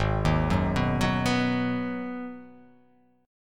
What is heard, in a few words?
Ab Minor 7th, chord; arpeggiated; JGuitar